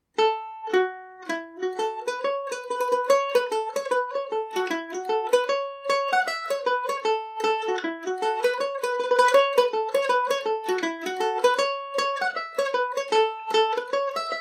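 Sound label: A major, irish mandolin; buck morans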